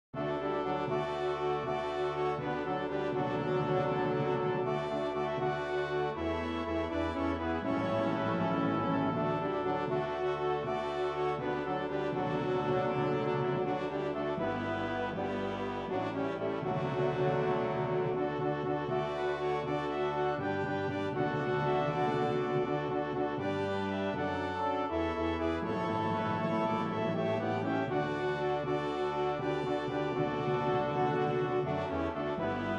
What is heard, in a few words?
Brass; Blessed Assurance